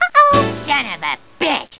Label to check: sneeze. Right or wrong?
Wrong